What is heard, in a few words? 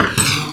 gear up; Ballist; Speeders